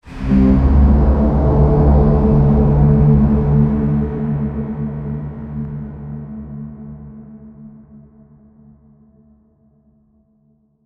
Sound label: horror braam fx with